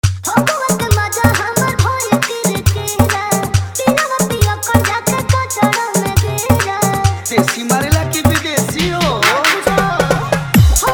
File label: Holi Song Dholki Bass Mix